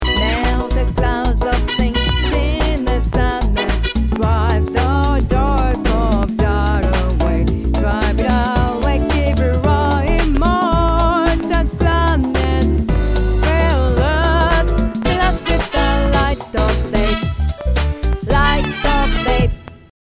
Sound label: Joyful C Voice Tenor; christmas